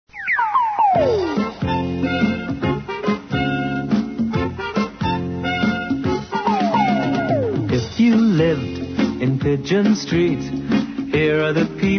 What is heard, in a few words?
Theme; Pigeon Street